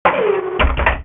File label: canon; modern warfare 2